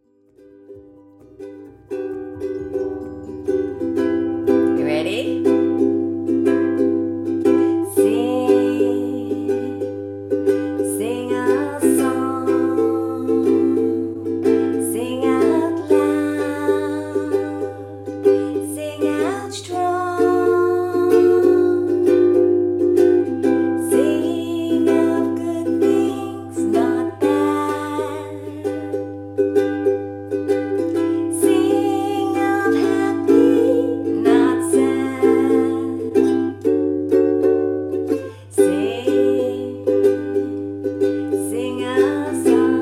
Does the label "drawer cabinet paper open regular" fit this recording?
No